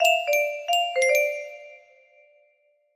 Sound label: music box melody